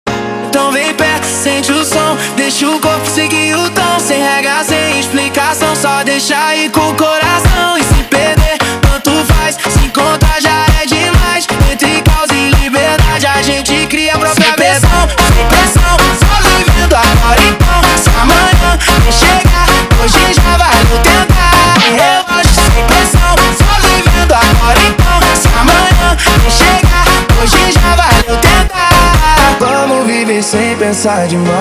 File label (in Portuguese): Montagem